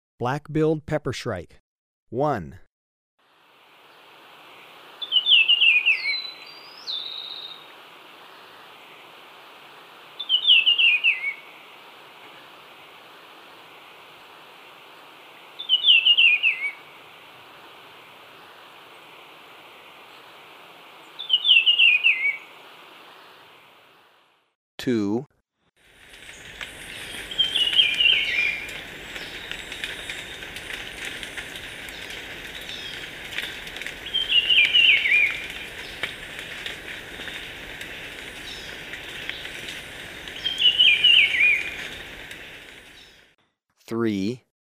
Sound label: Black-billed Peppershrike, Birds of Ecuador; S. America